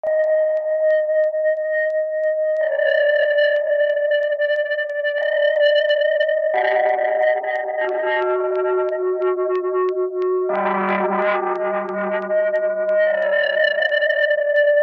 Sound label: indu floating acid, slow